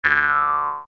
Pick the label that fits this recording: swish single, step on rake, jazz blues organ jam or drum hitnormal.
step on rake